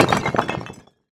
boulder crash, boulder fall

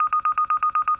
mine beep

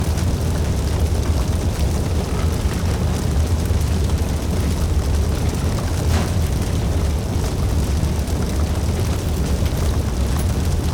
fire burn

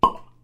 cork pop

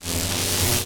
electricity zap

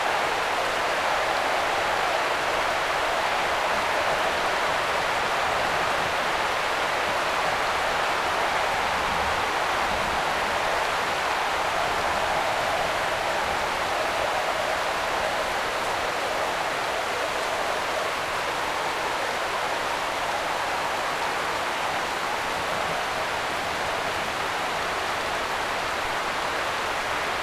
rain wind

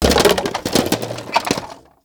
plastic debris, plastic debris crash

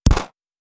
9mm smg shot; colt

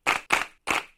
clap hands

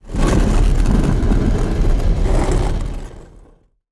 stone door, cement

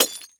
glass shatter; shard

